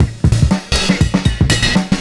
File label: CYMB, Breakbeats; USB Soundscan vol.46; AKAI